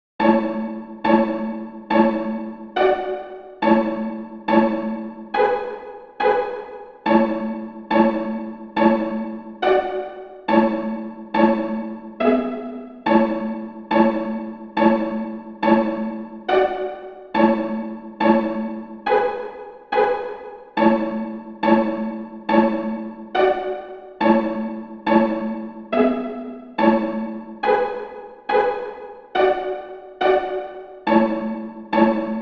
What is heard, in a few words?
Chords 140bpm, mandolin